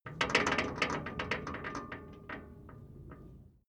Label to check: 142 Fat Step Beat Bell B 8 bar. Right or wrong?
Wrong